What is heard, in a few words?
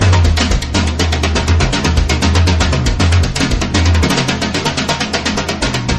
drums; horde